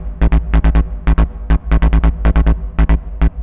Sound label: Bass Loop 140bpm